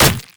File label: monkey king basic attack; LoL